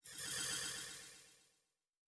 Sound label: Multimedia Internet CD-Rom Flash Click Multiple